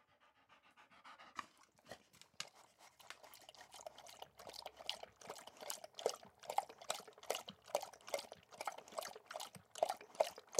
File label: Dog Pant Drink Water Lap Slurp Tongue Plastic Bowl; Animal; Dog Sound Effects